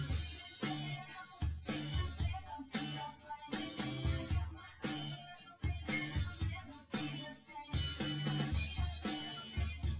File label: wdrums; SiSEC08; SiSEC underdetermined